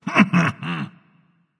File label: heh heh, voice; Smite; ymir skin14